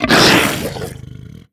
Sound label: barnacle grab react other; Half Life Alyx